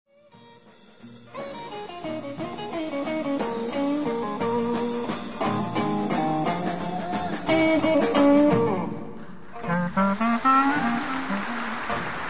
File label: swing; saratoga